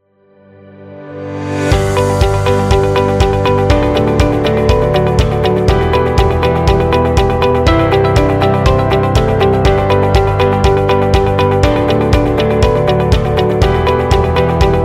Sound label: Company Corporate Rock